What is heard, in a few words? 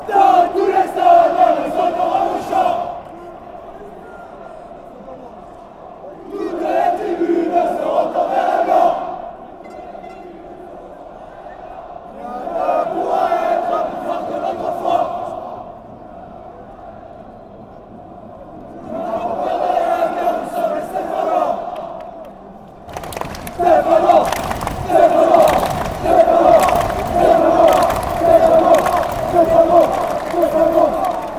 shouts, fanchants